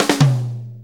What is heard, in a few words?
4-4 Fill, Drum Loops; Sonic Foundry (Sony Creative Software); Drum Tools; Fills